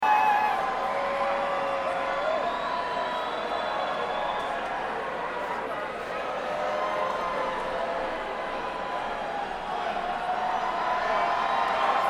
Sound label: Crowd